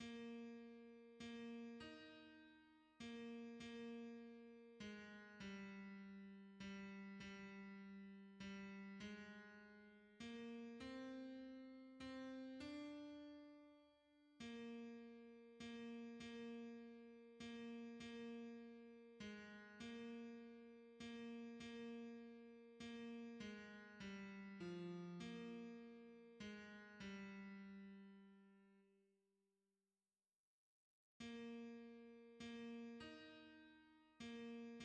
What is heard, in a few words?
tenor; hymns; May the Saviour s love and merit